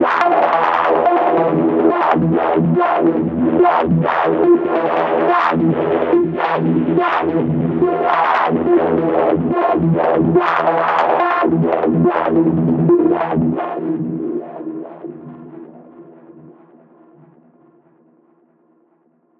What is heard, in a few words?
Lead Line C# 142 BPM, Psytrance; Lead Lines